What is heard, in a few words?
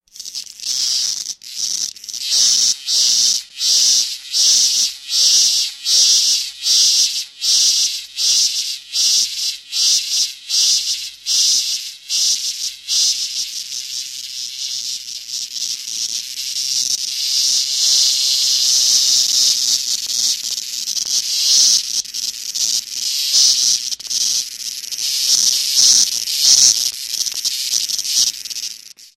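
sarancha zvuki, locust sounds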